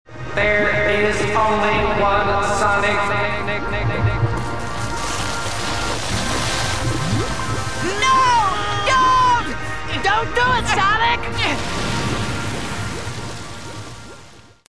fangirls cry; sonic movie

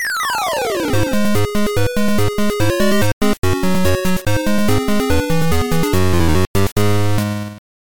gameover

